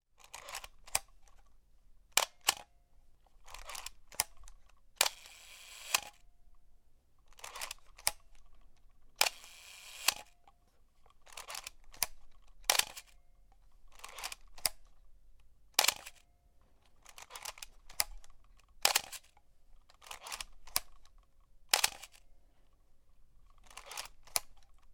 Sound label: Camera Clicks, Various camera clicks with different shutter speeds; 35mm SLR